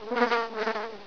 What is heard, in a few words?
flies, ambience